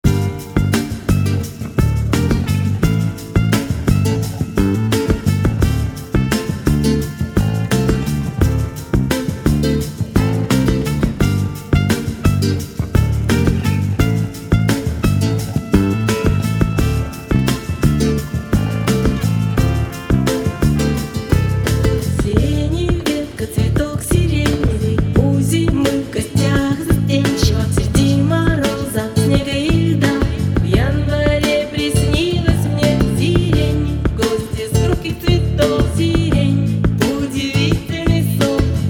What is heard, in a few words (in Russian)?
acoustic